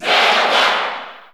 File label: Cheer English; Zelda; SSB4; SSBU